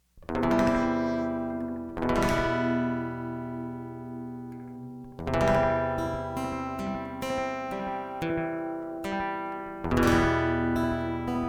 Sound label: acoustic electric